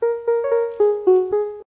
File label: atomic